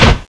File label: DOOR SLAM